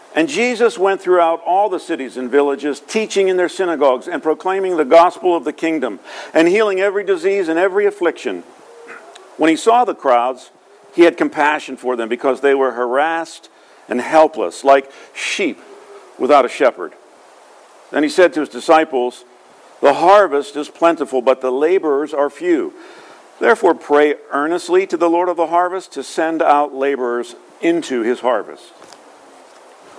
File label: Gospel